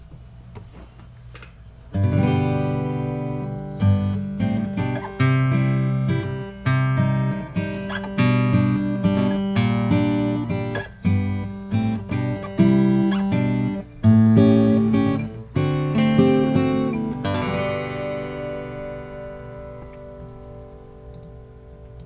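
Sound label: Taylor grand concert 12 fret honey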